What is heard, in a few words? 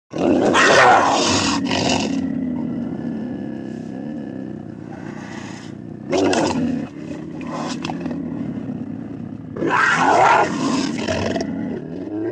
Puma Cougar Growls Gr, big cats cougars